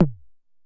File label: Conga Tom; Lost Tapes From Mars